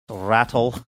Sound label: rattle